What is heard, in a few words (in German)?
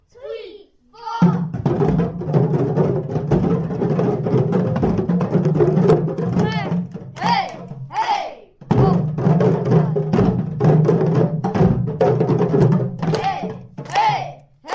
trommeln